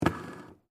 Arcade Game Wheel, Technology, Arcade, Game, Wheel, Ball, Spin; Arcades